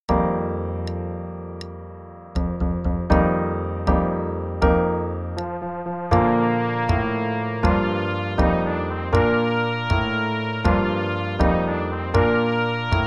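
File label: Play-a-long, all parts, quarter note = 80, trumpet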